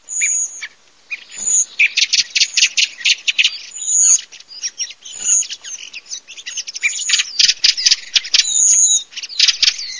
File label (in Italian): TORDO SCHIAMAZZO ZIRLO